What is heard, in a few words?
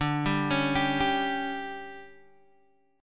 D-Major 7th Suspended 4th, D, Guitar, Open G